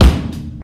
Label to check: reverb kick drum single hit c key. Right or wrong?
Right